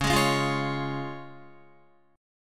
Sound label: Db Major, Guitar; strummed; JGuitar